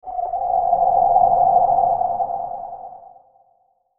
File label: Logo Hollow Swell; Sonic Logo; Blastwave